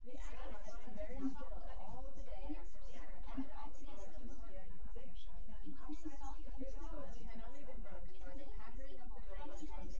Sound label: female synth conv sim; SASSEC07